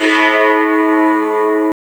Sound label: handmade piano